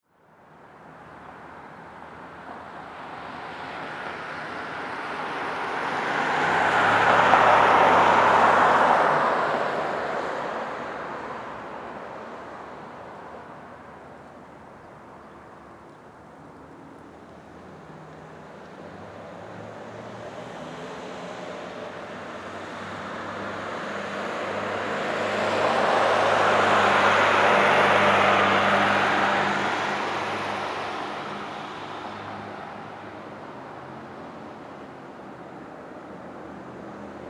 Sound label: Traffic City